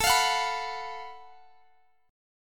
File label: Gb Minor Major 7th Double Flat 5th A, guitar